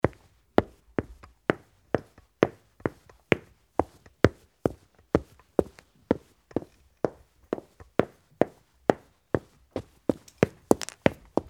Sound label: footstep shoes hard